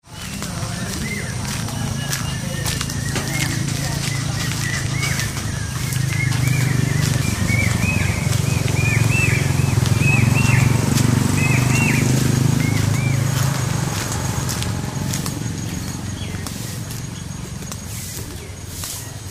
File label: soundscape walking in the gardens; matrimandir